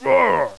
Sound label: die; Caesar III